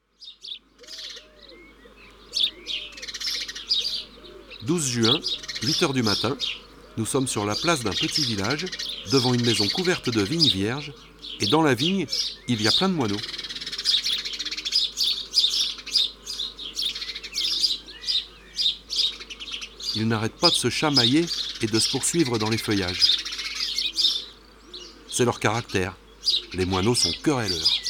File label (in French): Moineau domestique ABC des chants d'oiseaux